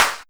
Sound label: Clap; osu